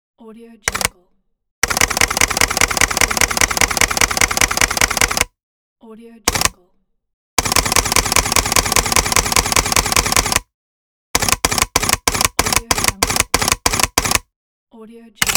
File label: camera shutter sony a7ii; pure-noise